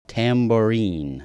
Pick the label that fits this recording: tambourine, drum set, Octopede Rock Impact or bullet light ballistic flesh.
tambourine